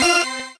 normal hit normal

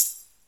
soft hit normal

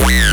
basstek

